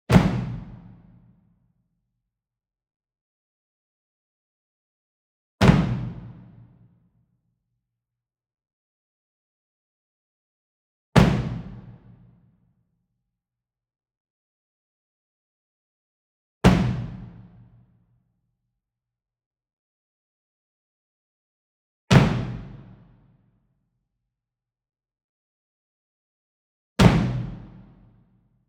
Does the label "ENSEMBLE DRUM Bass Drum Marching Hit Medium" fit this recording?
Yes